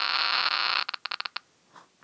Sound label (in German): Geigerzaehler; radioaktives